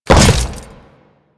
Land Default, Footsteps; Warframe; Grineer

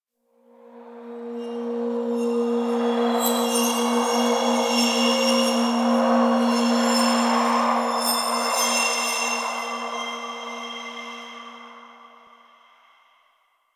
Interior Cave Spirit Gust, Ambience; Warframe; Duviri

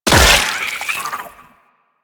Loot Crate Cyst Burst; Ambience; Warframe; Infested Eris

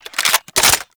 Reload, Weapons; Warframe; Gorgon

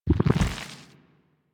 Nunchuck Pulse, Weapons; Warframe; Infested

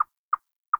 Forest Open Glade Chipmunk; Ambience; Day

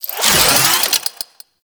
Necramech Repair Drone Claw Spin; Warframe

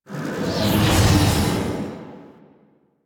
Portal Touch; Exterminate; Warframe; Zariman

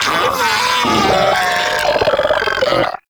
Arachnoid Vocal Death; Enemies; Vocals; Warframe; Corpus; Arachnoid Hunger

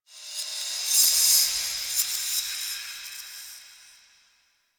Metal Whispers; Warframe; Quests; TheNewWar; Ambience; Zariman

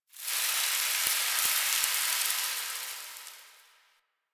Sand Fall; Warframe; Man In The Wall

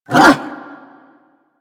Bark, Kubrow; Warframe; Tame

